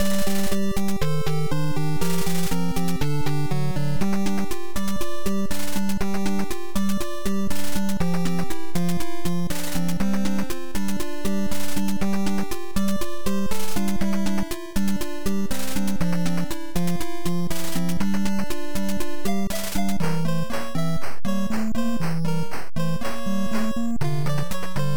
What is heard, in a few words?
Cave; Tenchi o Kurau II Shokatsu Koumei Den; Family Computer